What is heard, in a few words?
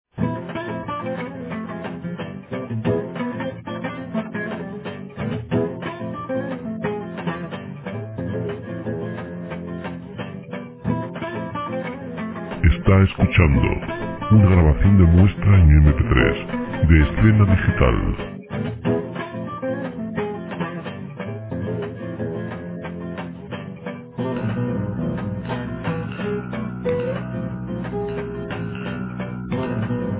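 guitar spain 90 solo guitarras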